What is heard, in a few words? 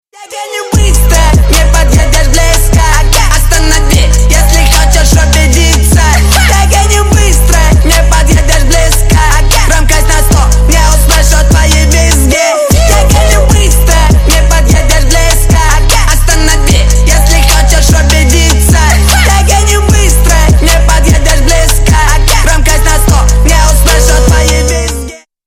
bass boosted remix